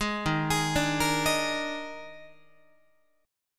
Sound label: D sharp Major 7th Suspended 4th, D sharp, down, Guitar; arpeggiated; D#M7sus4; 11,11,11,12,14,14; JGuitar